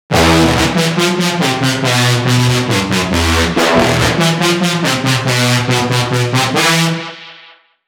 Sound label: Melody Aggressive